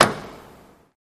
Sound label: Bed Motor Elect End; latches & bolts